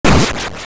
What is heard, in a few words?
Enemy Kill; Kill an enemy